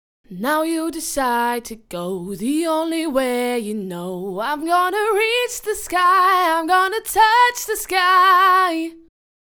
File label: acappela 120bpm A